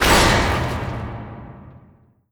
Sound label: pit entrance; scripted sequences; reviver